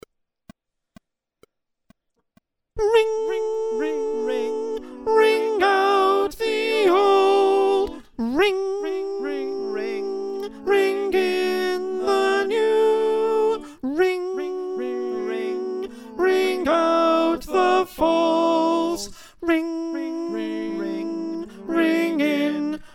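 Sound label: soprano, choir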